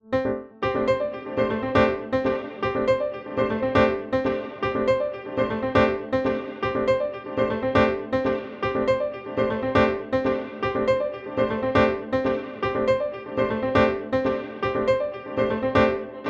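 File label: Fade Away with Ducking, piano; feedback echo plugin; Newfangled Audio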